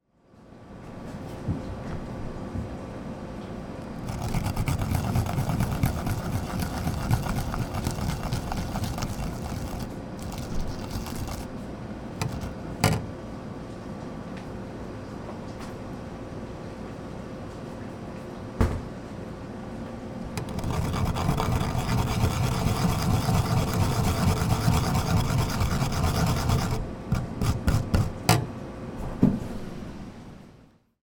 wasabi wo sutteiru oto; わさびをすっている音

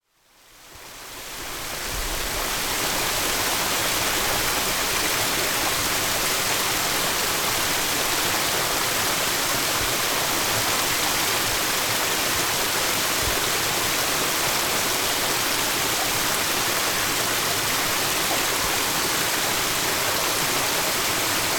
kawaoto; rakusa kou; Chino Cultural Complex

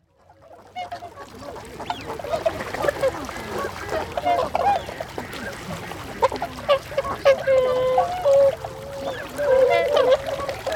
白鳥（諏訪湖）

